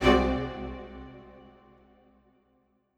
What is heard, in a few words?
Strings Hit Staccato